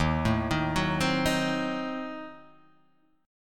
D sharp Diminished 7th, Guitar, Half Step Down

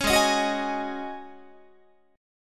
G sharp 7th Suspended 4th G sharp, Guitar

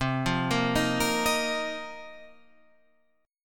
Bb Suspended 2nd Suspended 4th C

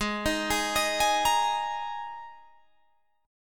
Ab Suspended 2nd Guitar Drop D

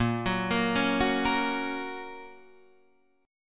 A sharp Minor Sharp 5th, Guitar Standard; arpeggiated; JGuitar

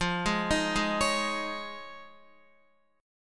F Minor 7th Sharp 5th Guitar; Fm7#5 arpeggiated; x,8,8,8,8,9; 4F,4A,5C,5G,5C,6E

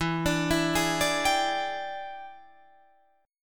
E 7th Sharp 9th Guitar Open D, chord

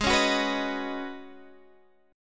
C sharp 7th Suspended 2nd Suspended 4th G sharp

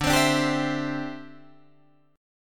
D 9th Suspended 4th D down Guitar Drop D

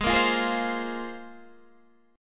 C 7th Suspended 4th Sharp 5th

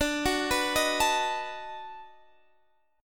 B 7th D; B7/D arpeggiated; JGuitar

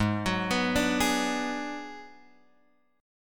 Ab Minor 7th Flat 5th, Guitar, Open F; arpeggiated; JGuitar

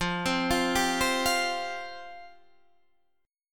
F Suspended 2nd F

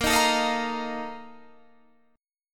Bb Major 7th Suspended 4th, Guitar, Drop D

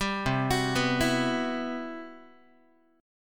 C Major Sharp 11th, C, down, Guitar; CM#11; arpeggiated; 10,8,9,9,8,x; 4A,4E,5A,5D,5G#,6C#

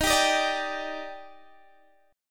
D sharp Major 7th, Guitar DADGAD

